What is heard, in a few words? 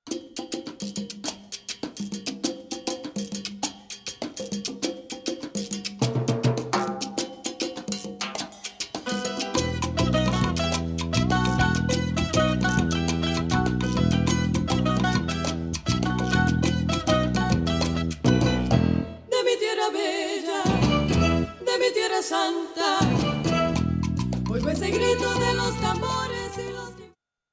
latin american